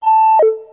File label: Print Complete